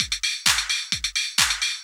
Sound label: drum 130 hihat, Techno Trance, Drumloops